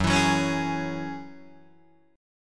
Gb 7th Suspended 2nd Gb Guitar DADGAD; Gb7sus2; strummed

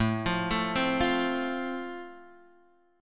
A Minor Sharp 5th A; x,0,3,2,1,1; arpeggiated; JGuitar

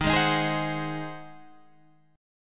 G sharp 7th D sharp

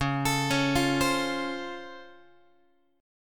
Db Augmented Major 7th, down, Guitar; arpeggiated; JGuitar

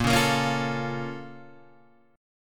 A sharp Minor Major 7th, Guitar Open G

